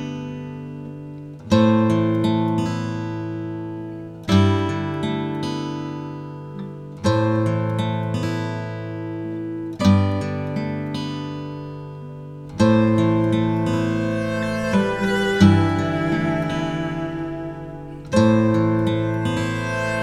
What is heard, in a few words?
sad song